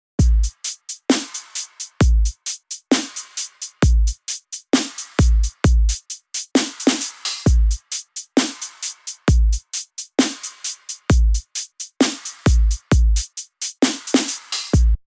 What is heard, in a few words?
Drum Reggae